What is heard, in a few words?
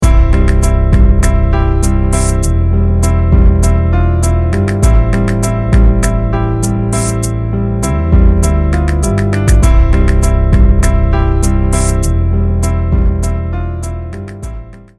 jingle